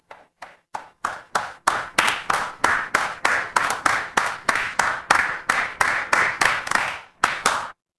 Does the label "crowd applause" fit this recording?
Yes